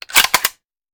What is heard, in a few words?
Machine Gun Foley; Sound Ideas; Guns